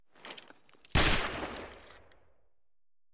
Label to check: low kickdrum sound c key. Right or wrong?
Wrong